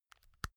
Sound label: insert usb c slow, elektronik; objekte